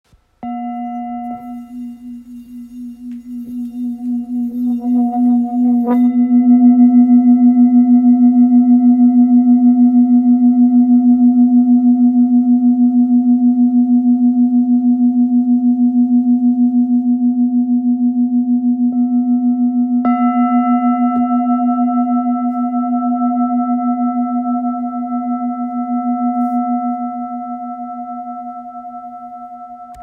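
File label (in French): Bol full moon si, bols chantants tibétains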